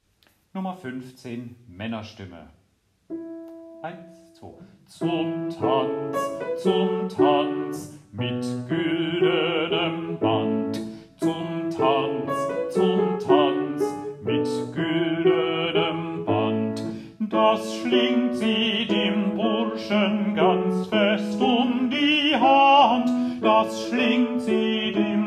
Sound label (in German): Männerstimme; Dioezesan Caecilien Verband; DCV Chorfahrt; Übaufnahmen